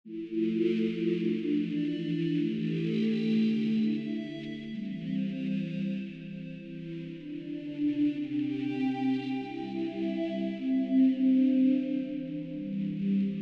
Fees et clochettes

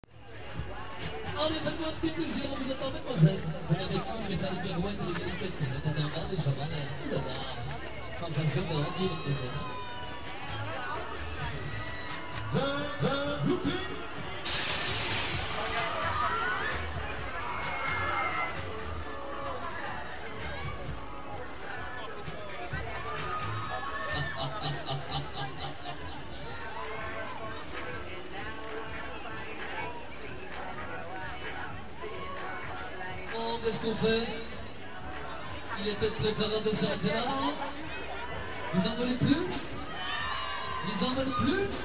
FForaine Looping

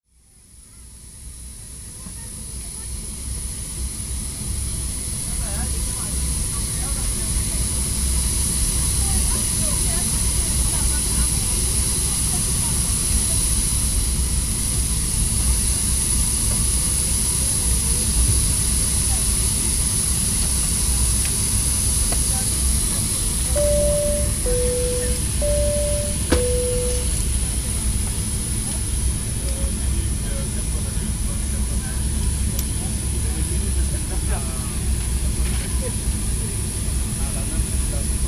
Avion Remplissage

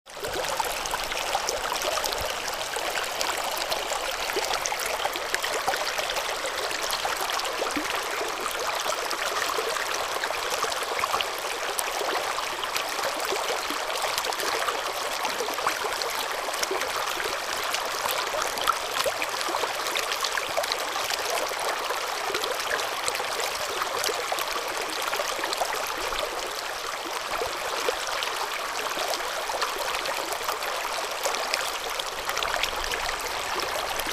Dades Imzzoudar

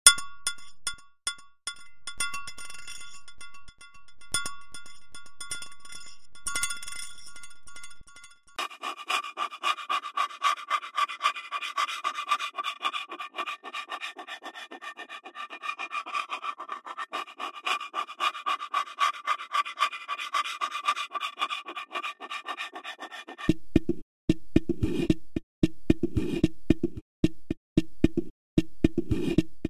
Calebasse tpo 112

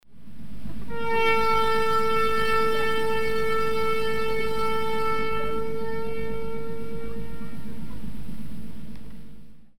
Corne Sur Bateau

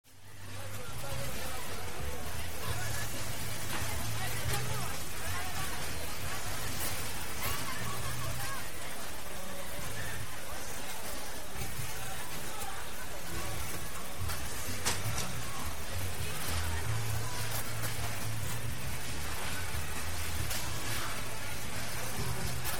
FForaine Foule

